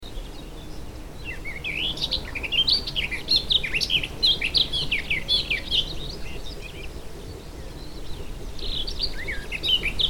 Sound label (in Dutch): Tuinfluiter, Brandenburg